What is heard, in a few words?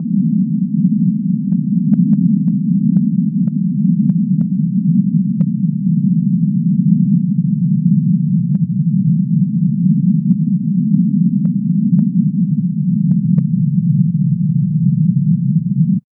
ambient drone